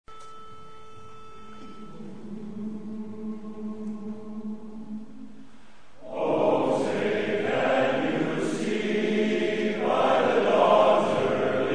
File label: Clinic Chorus